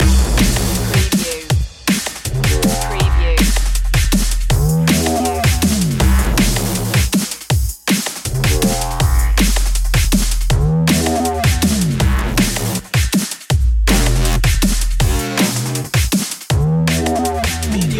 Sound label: moving up loop